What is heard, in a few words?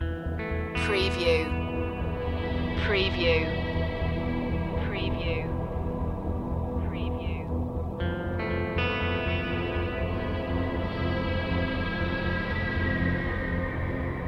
spy tension loop